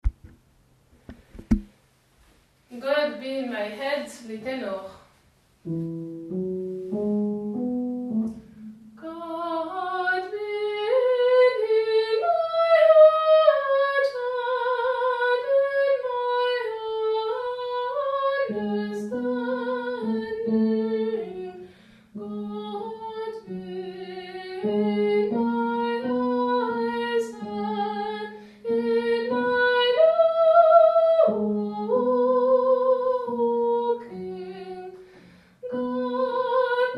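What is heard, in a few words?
God be in my head ténor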